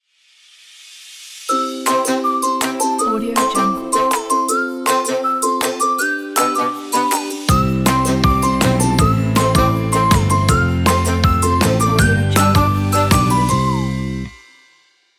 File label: happy logo